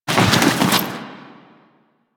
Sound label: Roll Forward Foley; Warframe; Tenno